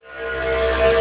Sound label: Snd Lost Conn; NATICQ2; Naticq pack